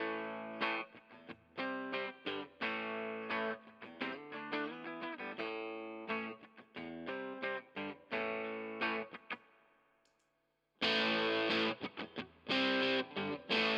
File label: Harley Benton DC-60 Junior Fuzz